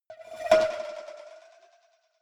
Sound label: cowbell scratch hit move soft